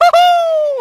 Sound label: hoo hoo